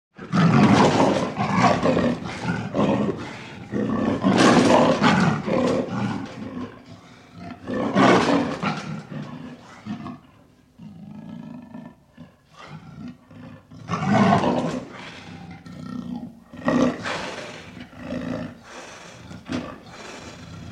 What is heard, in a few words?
Leopard Roars & Growls in Cage; Birds And Animals